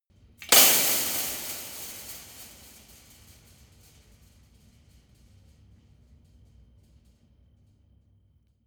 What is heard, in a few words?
metal impact fence long